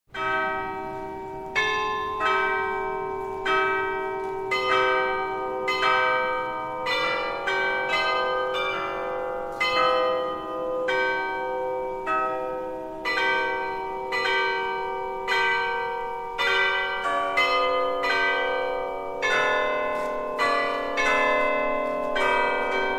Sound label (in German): Klingelton